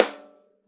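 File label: RAW snare drum, Drums Acoustic; USB Soundscan vol.10; AKAI; 90s sample CD; RAW KIT 1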